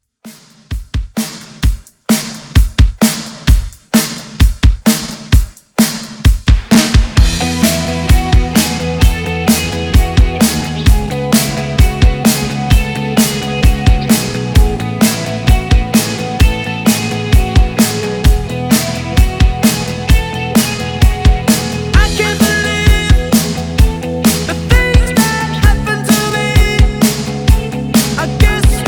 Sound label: Re drum 130Bpm